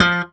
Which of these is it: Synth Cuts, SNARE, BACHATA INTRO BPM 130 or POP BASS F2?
POP BASS F2